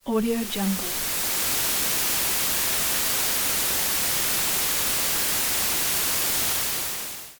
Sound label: tv white noise